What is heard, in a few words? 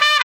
STAB